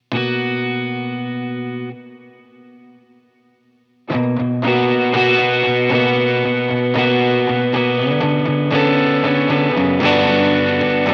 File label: guitar; Comp → Cicada → Amp → Verb